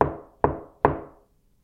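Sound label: knock on wooden wall; knocking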